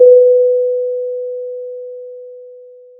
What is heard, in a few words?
Sound of a church bell